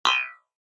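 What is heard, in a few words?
Thick Electric Spring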